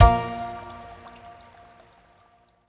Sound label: win Small; netent